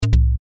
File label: fire fail; Adventure Time - Elemental; ThrillGames